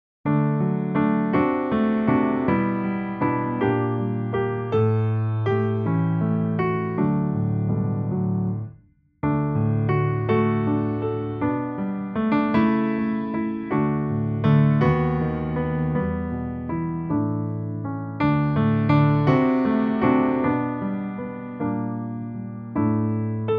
colours backing

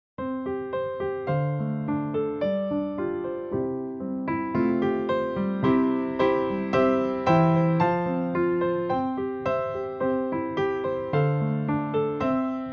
praise him praise him backing

